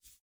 jump

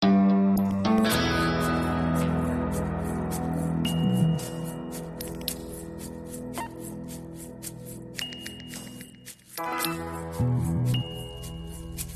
Loop Tense; tales from the borderlands gamerip 2015

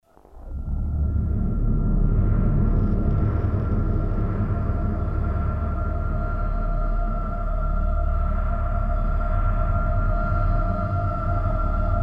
ambient suspens; Necrovision; ambient #1

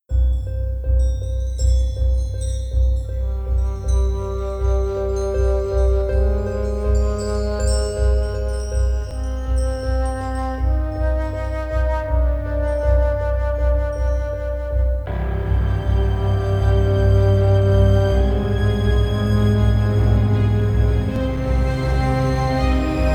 Suspense

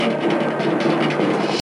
Desert Percussion

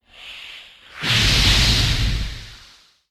sound Gold Dragon Head

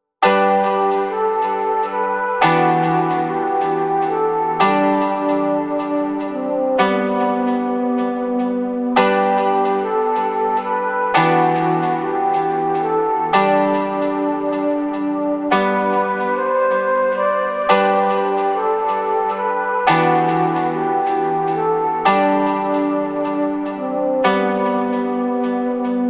loop

